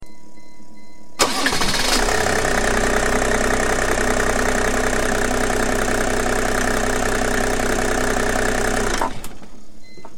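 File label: E300 Turbo Diesel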